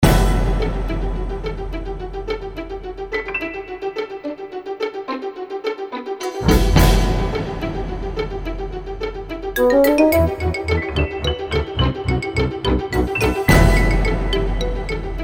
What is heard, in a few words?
fast plucked strings winds